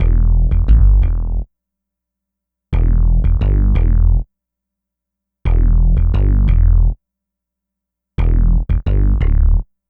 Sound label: Synth Bass; Bass X